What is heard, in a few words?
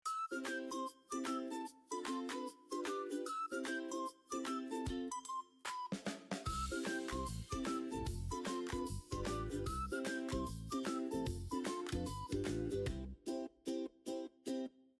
music